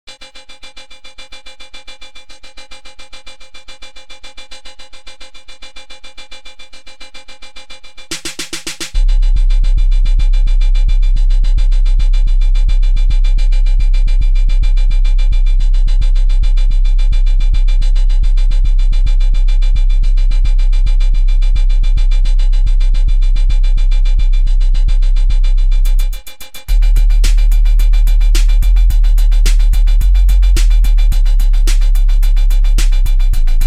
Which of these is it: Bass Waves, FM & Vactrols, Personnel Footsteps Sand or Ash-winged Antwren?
Bass Waves